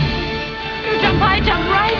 jump

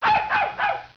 bark

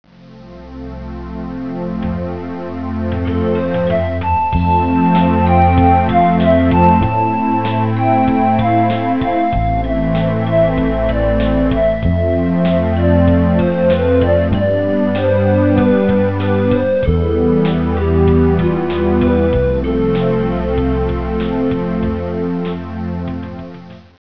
Ballad

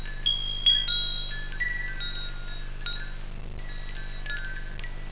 chimes

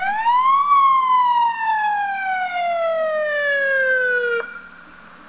wail

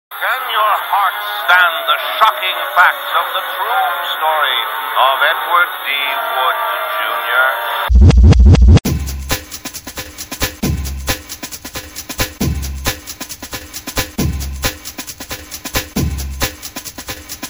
wood mix

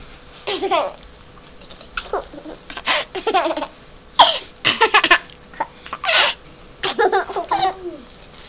boo

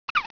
hic

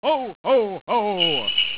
ho ho ho

